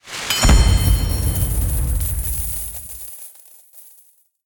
mega win text change; jumanji

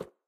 land stone

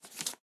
wood tile drop

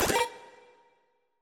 Homing Missile Target Change

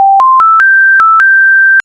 scanner beep charge

